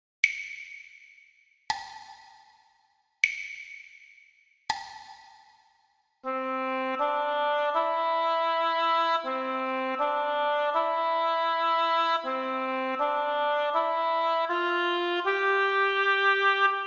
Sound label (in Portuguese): percepcao ditado